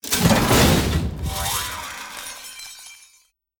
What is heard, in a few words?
Whirling Zapomatic Death Underlay